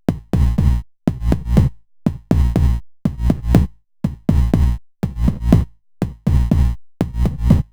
Knobula Kickain Mono Loop Acc

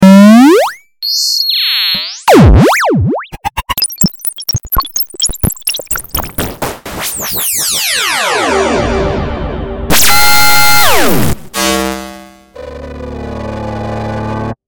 Noise

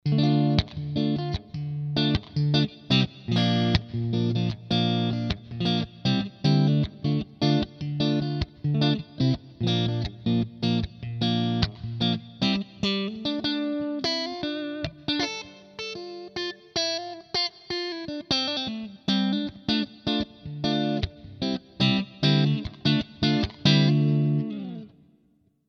Schecter Neck Middle SC clean; Nick Johnston

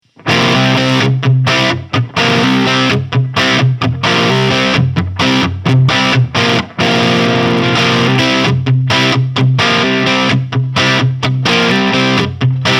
Super Shredder Exotic FR Ziricote Medium Crunch; Sun Valley

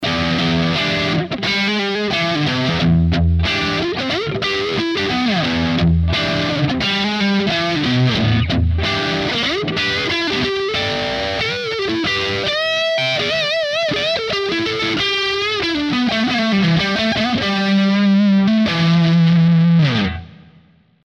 Neck Singlecoil Distortion; Ibanez AZ226

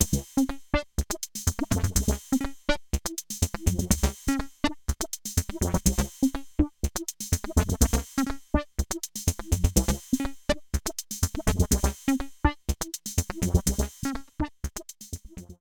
System 500 Envelopes

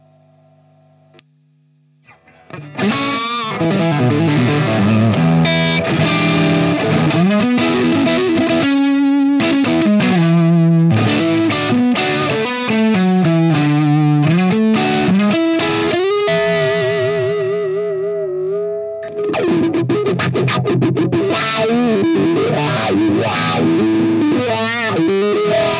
Cry Baby Solo

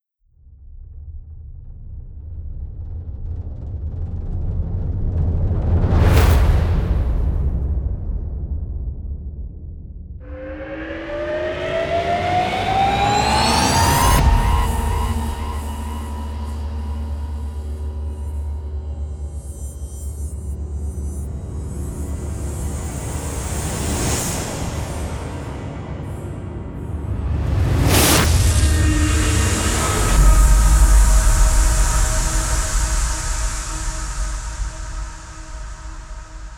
Decay Master

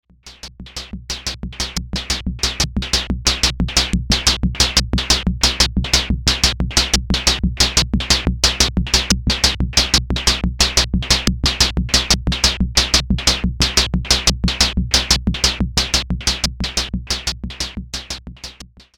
Noisy

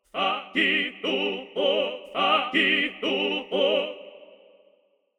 Voices Of Opera Tenor Staccato

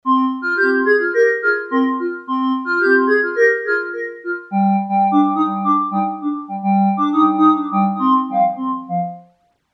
Roland JX03 Digital Harp

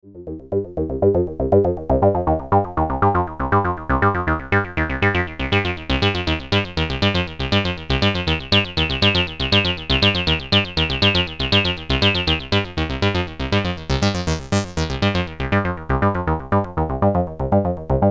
vca

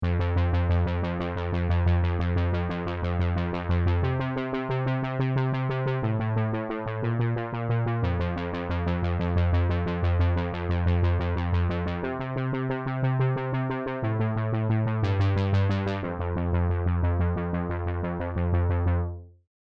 Arp dry; U-He Twangstroem; Tyrell N6

